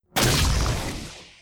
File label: shot